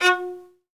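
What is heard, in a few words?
solo violin, strings; ILIO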